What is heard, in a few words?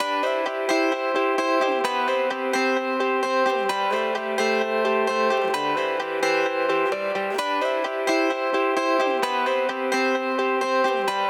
Guitars